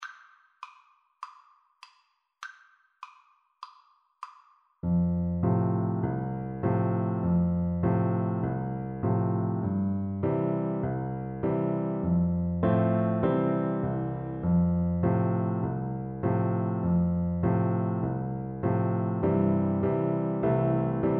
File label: mary had a little lamb, Tuba